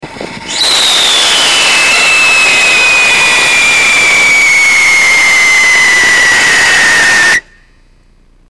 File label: copper chromite, rockets, whistle tests